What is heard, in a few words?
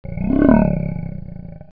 deep growl